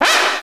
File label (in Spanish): Grito de Tepig